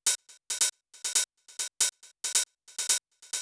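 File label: hi-hats delayed, techno 138 bpm; Best Service ProSamples vol.54; AKAI; CHICAGO 5 O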